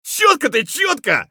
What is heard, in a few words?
scav cheer; eft